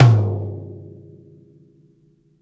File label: ROOMY TOM HARD, DRUMS ONE SHOTS; BASEMENT DRUMS VOL 2